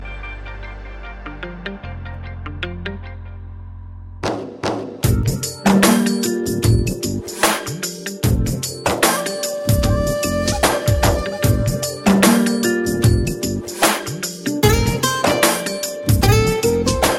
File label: Guitar